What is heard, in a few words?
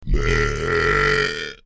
16-bit Burp Alert